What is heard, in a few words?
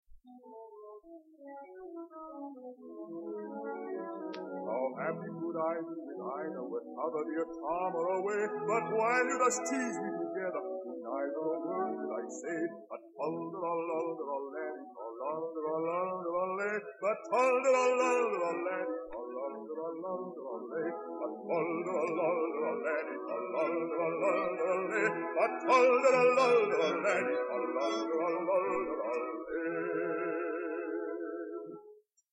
air